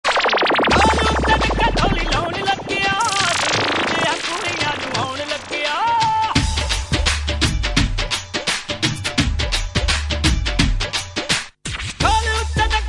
Dhol; UK Punjabi

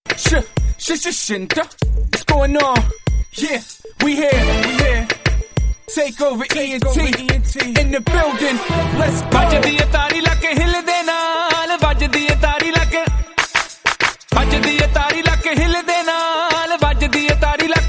Punjabi Clap

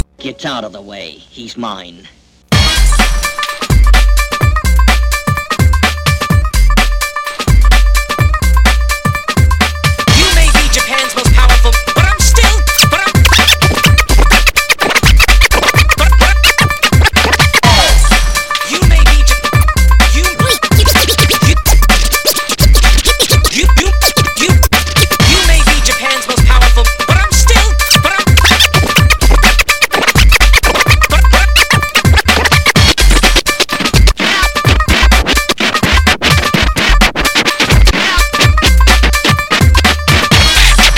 Jugni Live Scratch; UK Punjabi

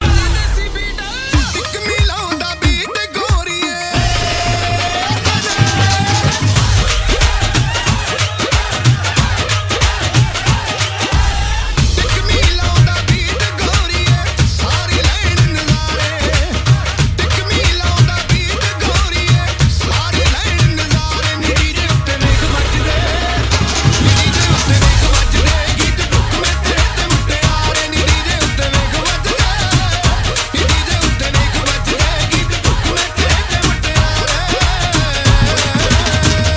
Desi Beat Bhangra Segment

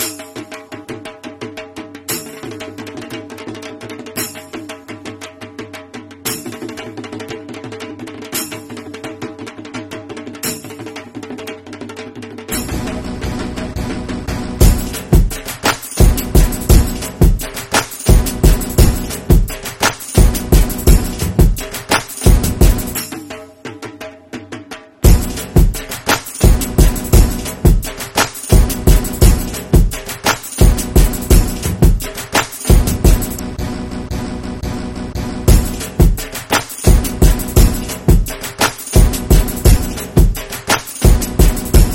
Garba Hop

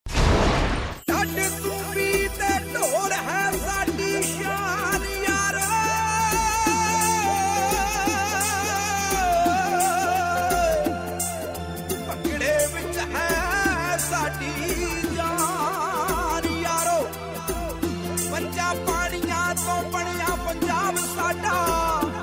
Bhangra; UK Punjabi

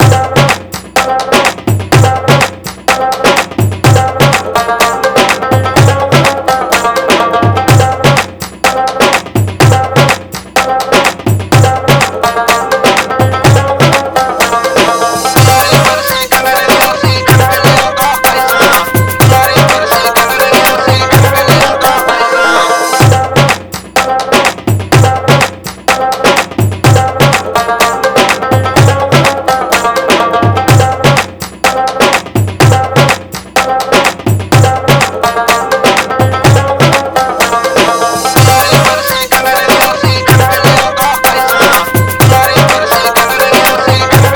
Desi Mix Instrumental; UK Punjabi

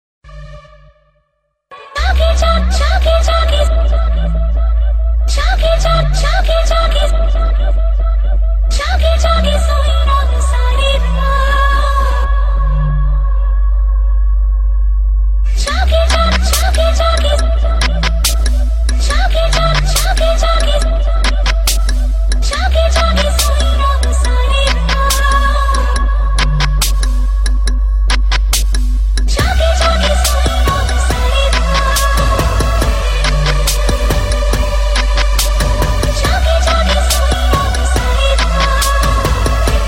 Dubstep; UK Punjabi

